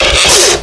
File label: gear, manhack; hl2